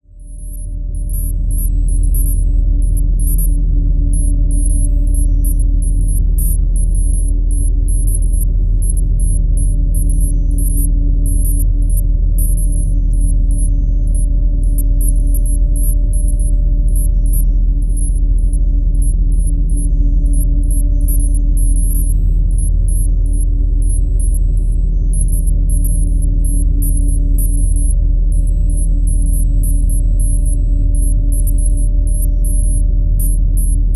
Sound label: background command center ambience; Bluezone Corporation